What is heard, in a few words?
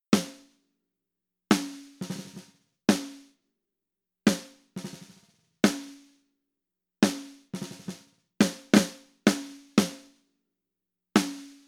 Snare No EQ